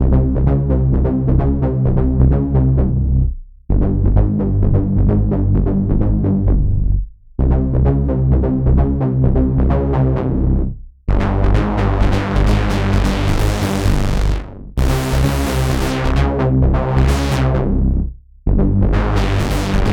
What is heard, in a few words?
Synth Bass; straightliner